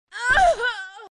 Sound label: Monster dead